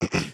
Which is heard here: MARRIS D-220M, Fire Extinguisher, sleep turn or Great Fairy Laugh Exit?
sleep turn